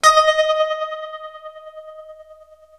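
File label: tremolo D#4, guitar; East West; Steve Stevens Guitar Samples Collection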